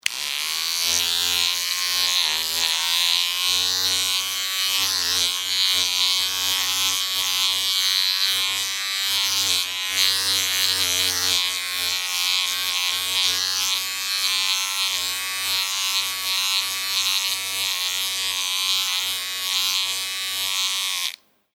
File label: Buzzing sound of electric shaver appliance; Appliances (Sound Effects:Household)